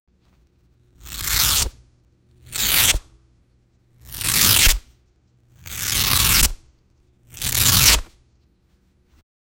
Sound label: violent paper rip; violence